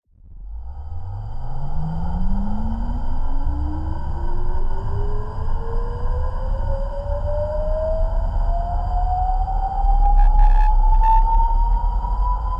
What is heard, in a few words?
Amb Scifi Energy Rise; Energy & Forcefields